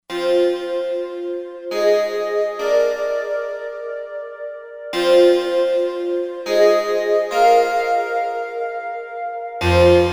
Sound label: SideBandBell, Roland Fantom XR